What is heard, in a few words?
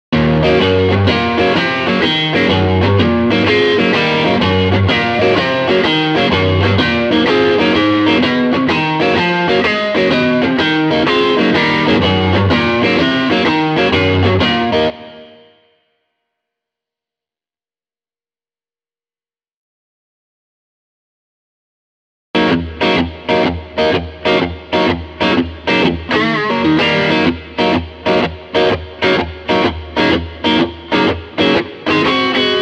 Rhythm Guitar Smooth